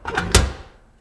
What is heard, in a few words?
peep cl; Mafie 1